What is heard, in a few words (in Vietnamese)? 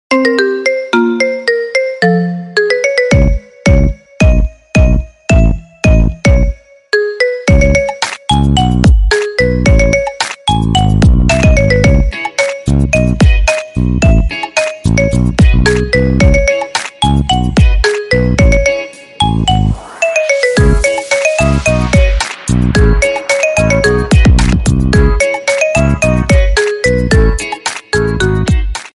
nhac chuong dien thoai marimba remix